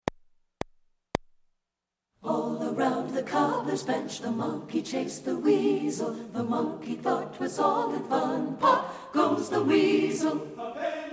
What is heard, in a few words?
alto; satb